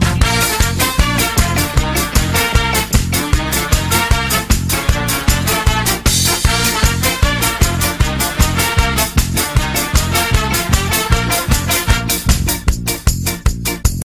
Happy Melody

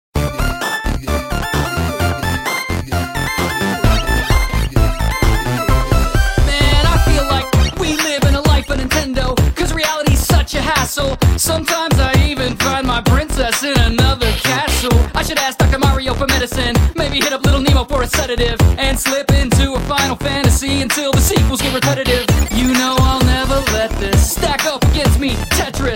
8-bit World

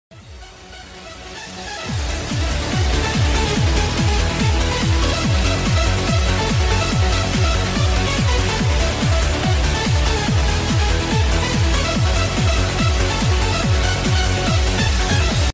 Rave

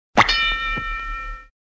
Spittoon Text Alert

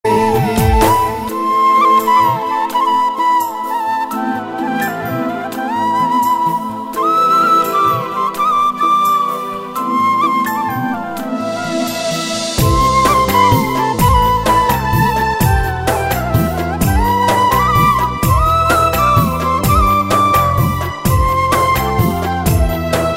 Most Beautiful Tone

